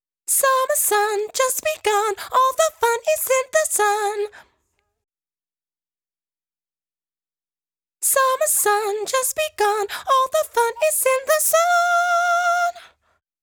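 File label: F minor Chorus Octave High Female Vocal, 124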